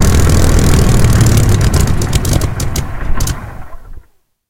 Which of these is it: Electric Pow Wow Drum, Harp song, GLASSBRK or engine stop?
engine stop